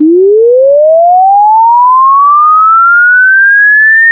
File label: sweep sin